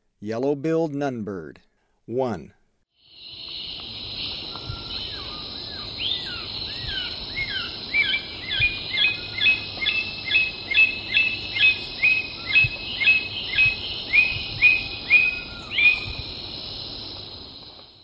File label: Yellow-billed Nunbird; S. America; Eastern Lowlands